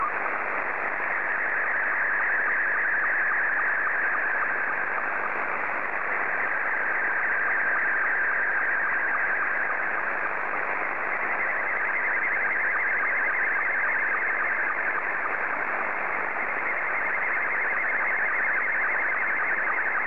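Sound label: Scottie 2, slow-scan television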